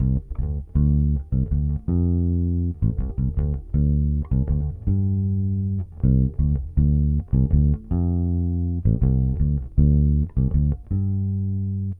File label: bas 80 Dmin, Rhodes; Best Service ProSamples vol.34; Hip Hop & RnB 3